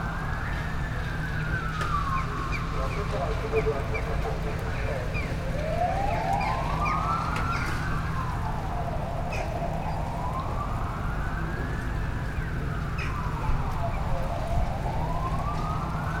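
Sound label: Ambience emergency City Police Distant; Kvv Audio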